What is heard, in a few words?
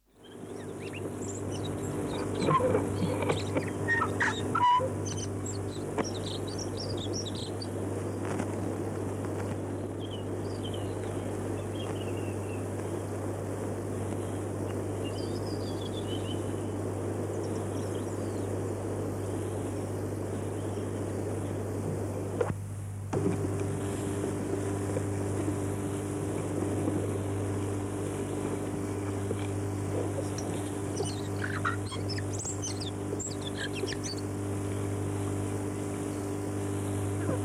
chatham island tui, bird song; conservation, native animals, birds, bird song